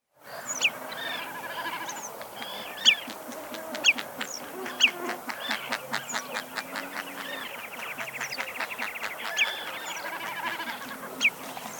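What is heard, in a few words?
northern lapwing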